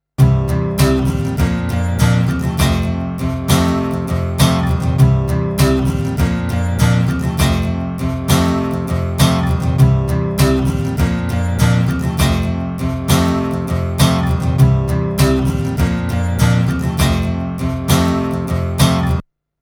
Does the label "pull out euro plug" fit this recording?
No